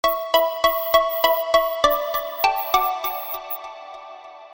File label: chopsticks sms; Sms Töne; Klingelton